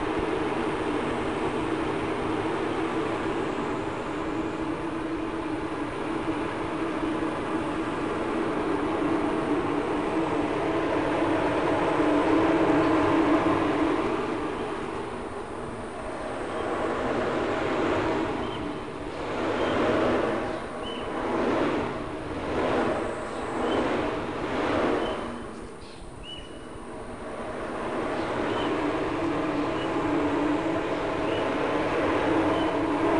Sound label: wind; soundscape